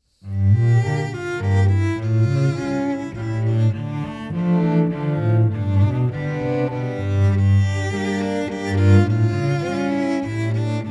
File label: Nigun, JEWISH SAMPLES, Strings